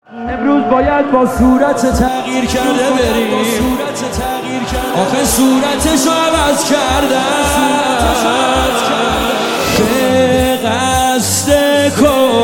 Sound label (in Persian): مداحی